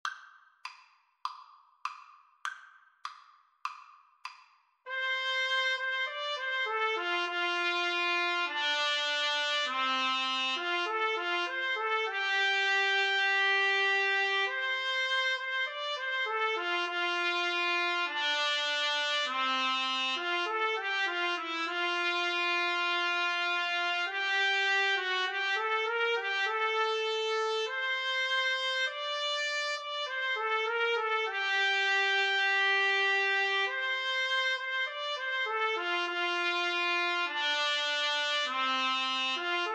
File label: Trumpet Trombone Duet